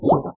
drink, witch; Minecraft

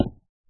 wood, dig; Minecraft

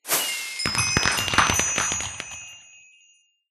celebration; Minecraft

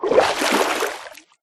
swim, liquid; Minecraft

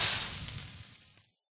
lava death; Minecraft

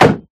block, shield; Minecraft

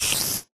spider; mob; Minecraft

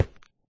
stone, step; Minecraft

